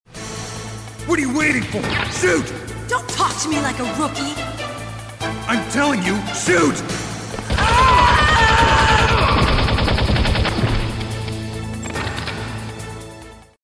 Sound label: shoot; MGS Samples